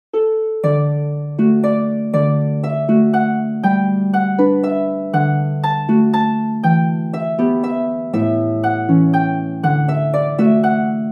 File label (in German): Harfe; Gloucestershire Wassail